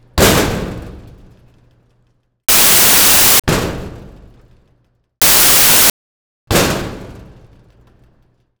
Creepy Door, Metal, Door, Medium, Impact; RZ Post; The Creepy Door

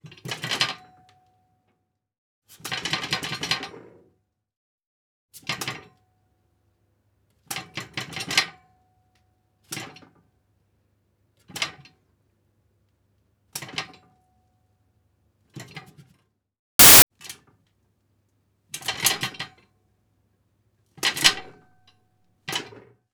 Bench Press Shaking, Sounds Of Bodybuilding; Christophe Davaille; The Gym - Sounds Of Bodybuilding

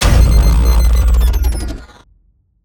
Hit Broken Circuit - Dry; Wakerone; Trailer Pack

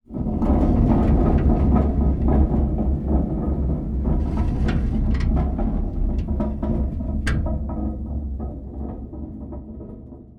Design - METAL - Earthquake; G4F SFX

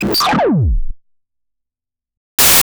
Laser-Like Synth Basic Laser, Analog Synth; Sound Spark LLC; Analog Synth Volume 1 Bleeps, Sweeps and Creeps

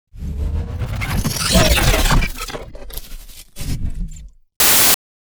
WARPED, SCI-FI Mechanics; Phil Michalski; PM SFE

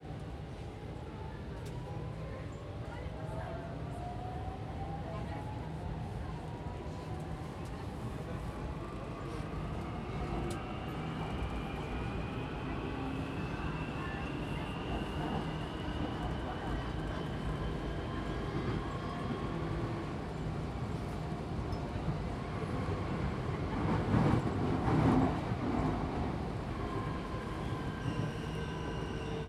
Train Subway Starting, Buenos Aires; Wakerone; Traffic X Buenos Aires

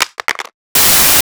Drop Smartphone On Concrete; The Sound Pack Tree; Dropping Things

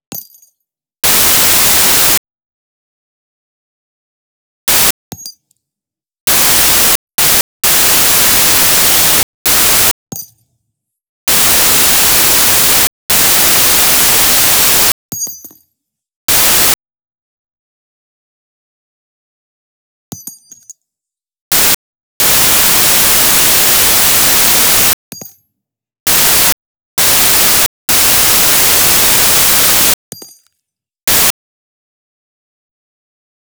Casing 50BMG Dirt; Watson Wu; Battle Rifles & Pistols

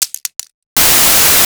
Drop Ballpoint Pen On Concrete; The Sound Pack Tree; Dropping Things

